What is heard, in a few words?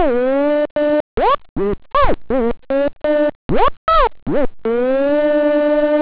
rave, scratch